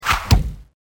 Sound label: throw knife